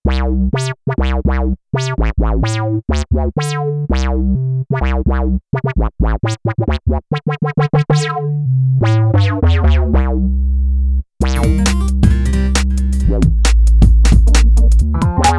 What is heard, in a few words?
Flip Bass, Korg Radias-R